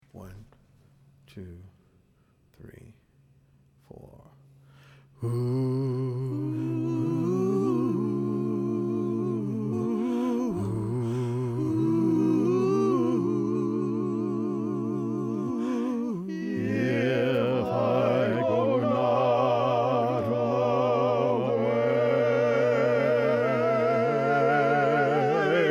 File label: bass